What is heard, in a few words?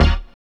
SYN, dance; Sound & Vision - Gigapack II